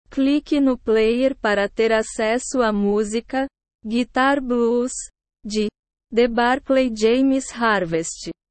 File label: guitar blues